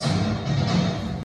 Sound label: intro effect